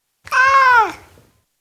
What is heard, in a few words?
Tom cat, individual meows; Cats; Animals; BBC